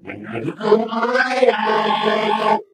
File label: ballbot where are you